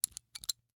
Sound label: Rachet, vanadium steel, 8in, standard, locking, clicking, mechanical, new, socket release, clear; Eiravaein Works; Vaeyan IV